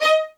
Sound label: MED ATT D# 1, soft violins; Q Up Arts; Denny Jaeger Master Violin Library